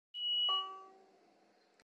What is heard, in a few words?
Scania parada